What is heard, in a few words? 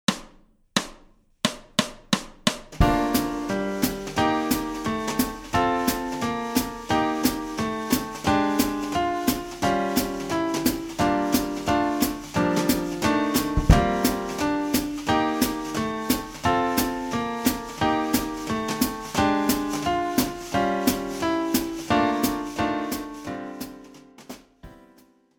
rhythm changes